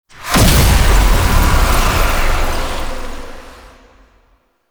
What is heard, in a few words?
Death Stinger; Enemies; Warframe; Entrati Doppelganger